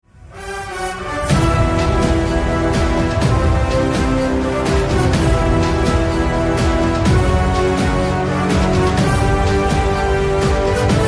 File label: Entry Tone Ringtone; Rocky Bhai; KGF